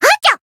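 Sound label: Voice attack, Japanese; myungwha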